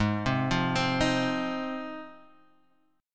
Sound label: G sharp 6th, Guitar, Half Step Down